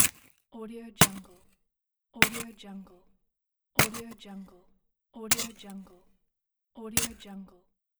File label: ice axe oblique impacts